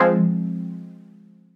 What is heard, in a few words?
Fm Dub Chord